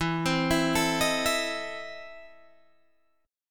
E Major 7th Suspended 4th Sharp 5th, Guitar, Open G